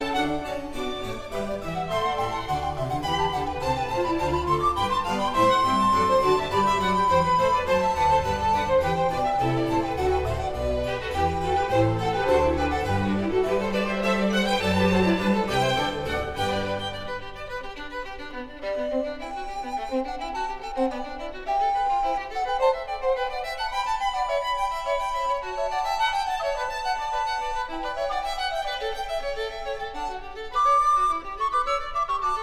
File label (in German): brandenburgisches konzert nr 4 g dur bwv 1049 presto